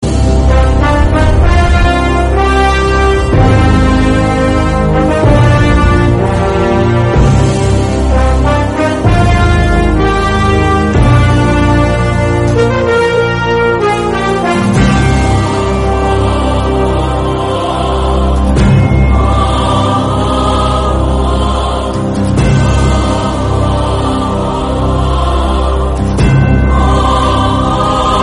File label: bgm music; khaleja